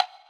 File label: Wood, Block Stick; Equipped Music; Loungin House